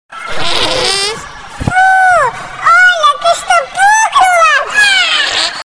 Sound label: fart